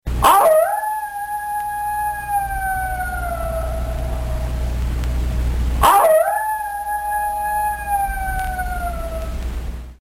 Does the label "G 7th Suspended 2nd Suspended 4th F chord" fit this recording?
No